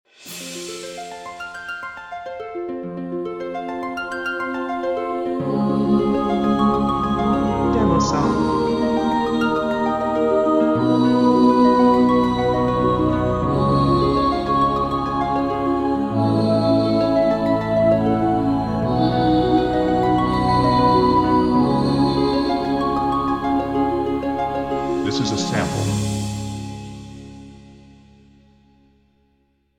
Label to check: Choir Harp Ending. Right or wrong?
Right